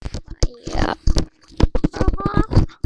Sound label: fire